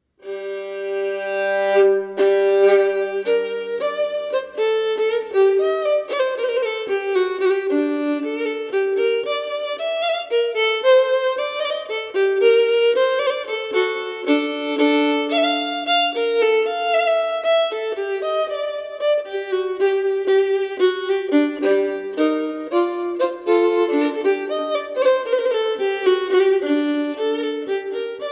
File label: Hornpipe